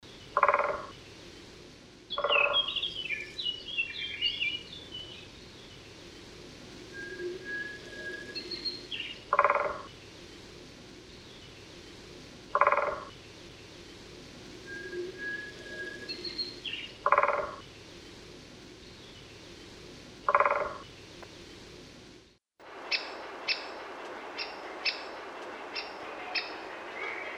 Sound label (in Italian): Picchio rosso; valsolda